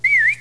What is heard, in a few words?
Whistle